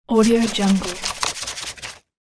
counting money

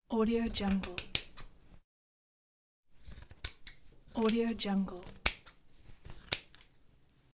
cutting cloth with scissors

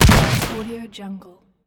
a rifle shot

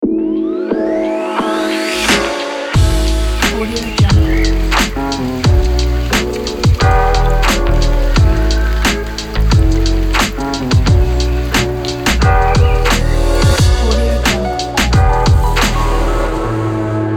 lofied intros and outros ident

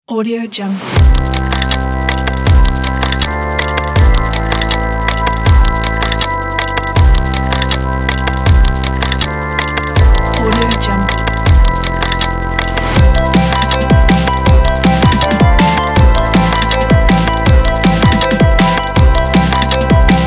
technology digital electronica

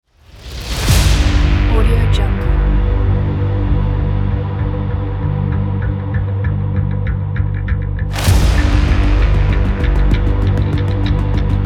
aggressive metal hybrid trailer

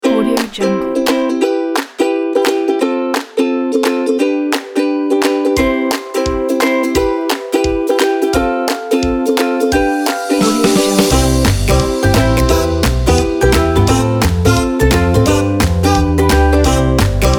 funny carnival

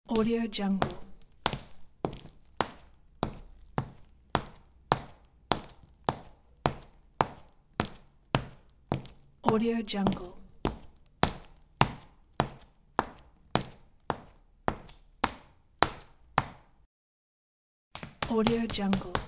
footsteps walking and running; soundfxpro

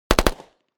weapons smg uzi 45 close

